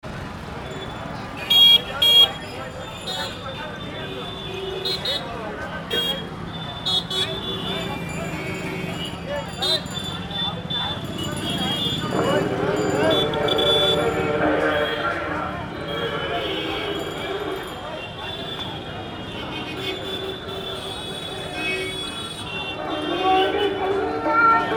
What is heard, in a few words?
Street Music, North India